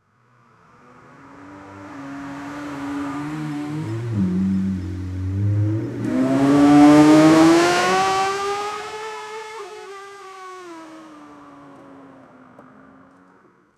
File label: v12 lamborghini; sports cars